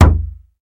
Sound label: lobby defeat; smite; Anhur